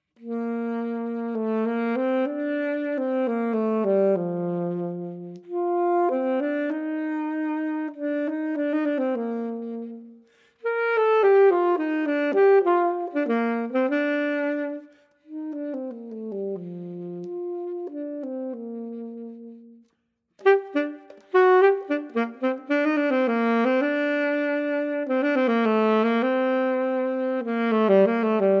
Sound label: Junior Saxophone